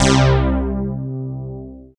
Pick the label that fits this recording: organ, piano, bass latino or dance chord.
dance chord